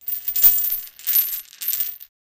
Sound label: chain sound